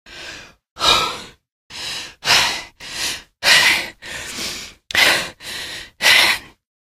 dark breathing; episodic; episode 1; Source engine

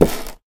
box together, styrofoam

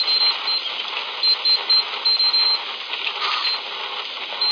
equipment beep loop, labs; ambient; source engine; hl2; video games

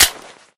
pistol clip locked; Left 4 Dead; Source Engine

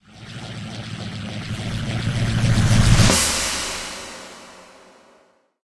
item warpaint apply stop

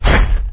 dog footstep walk; npc; source engine; episodic

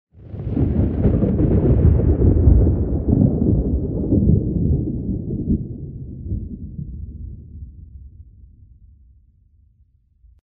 thunder; source engine; tf; lair

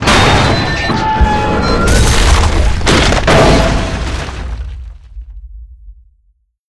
train bridge crash; farm; video game

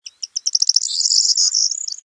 bird; Source Engine; tf

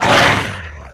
zombie dog attack

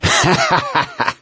laughter, gambler, survivor voice; source engine; left 4 dead 2

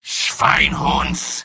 medic negative vocalization; mvm

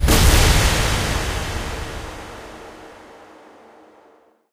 tram door open; video game; Source Engine; tf; lair

